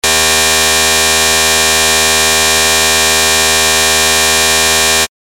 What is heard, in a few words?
Beep